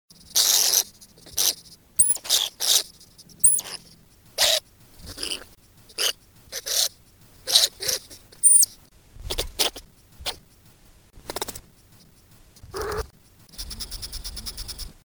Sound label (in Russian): zvuki prirodi suslik krapchatii spermophilus suslicus trevoga